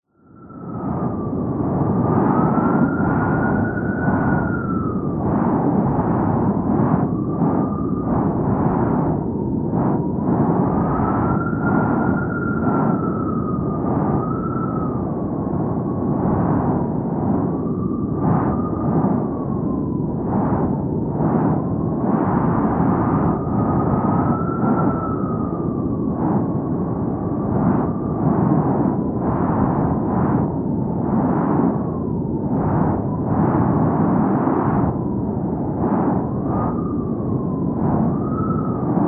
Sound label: nature wind strong stormy gusts high pitched howling